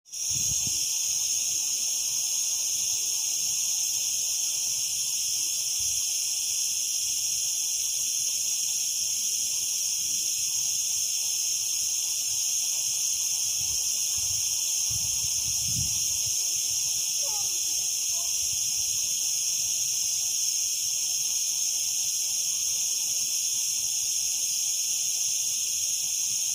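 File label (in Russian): полевая запись стрекотания выводка цикад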